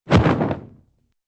parachute opens

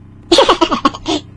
haha

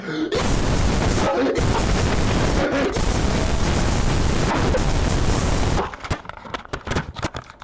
loud mic screaming

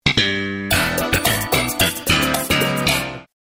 sitcom transition bass riff; seinfeld

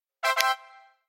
heel click; unreleased; sar emote

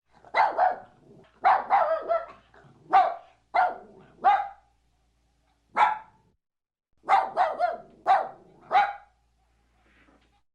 dog bark

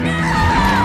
scream; minion 2015

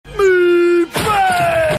car jump scream; texas chainsaw massacre; matthew mcconaughey

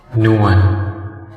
reverb

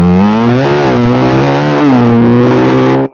motorcycle beatbox

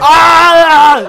scream; terroriser

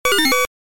monster touched; dig dug

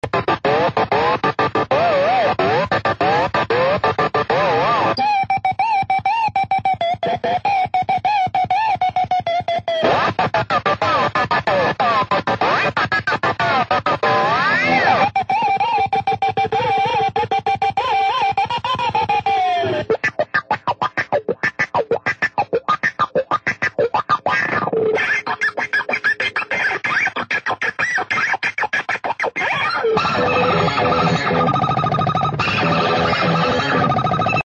pua pua pua puaaa pipipi

